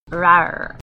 rawr

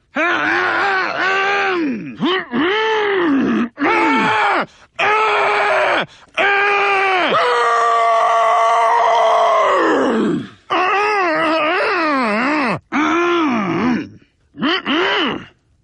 mhmhmhhhh

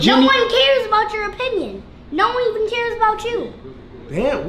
woo woop

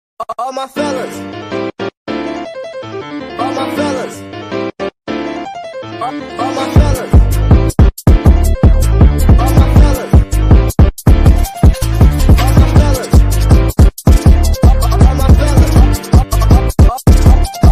bass boosted

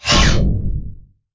space egg sound; angry birds space

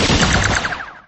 droid trifighter blaster; lego star wars

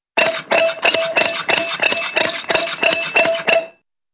fast car jack clunking

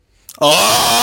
aughhhhh

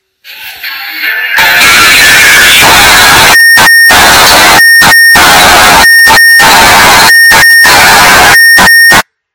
Mazda loudest chime

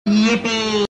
yippee; Luigi

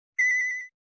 character switch

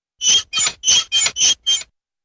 metal hinge creaking

